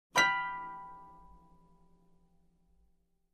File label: Very High Harp Chord